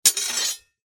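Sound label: warfare sword scrape against another in fight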